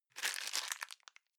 plastic bag small grab medium

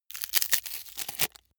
wood sticks small crack long

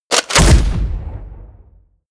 shot

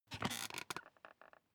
office chair creak sit up short

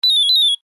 digital toy blililip

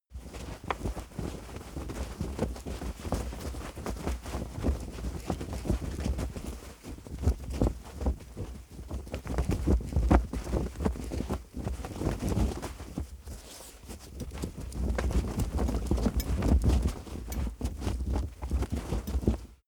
flag flap

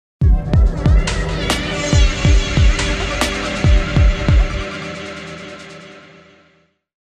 tech sweeper

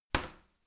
garbage container light lid shut hard

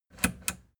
plastic button latch click enable slow

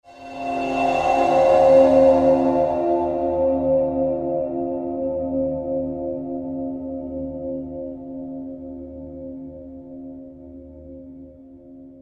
bowed verb

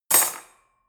cutlery fork drawer place hard

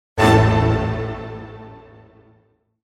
small orchestra hit

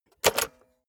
cassette player slide tray take out medium

